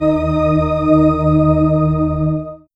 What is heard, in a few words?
Eb, Drum & Bass Pads; Total Drum&Bass; 90 s Sample CDs